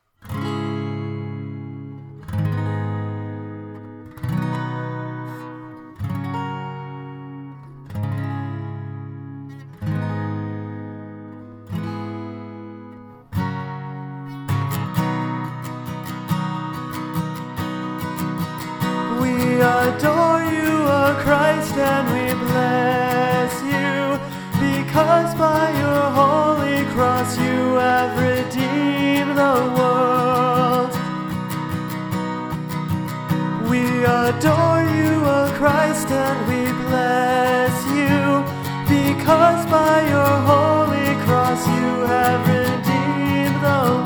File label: closing antiphon